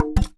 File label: Polystyrene Rattle